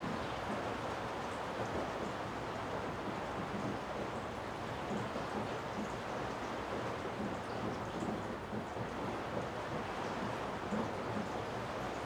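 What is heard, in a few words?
Rain, Interior, Garage, Ambient, Trickle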